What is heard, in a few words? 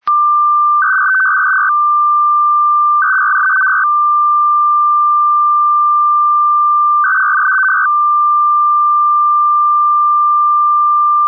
ascii 200; europe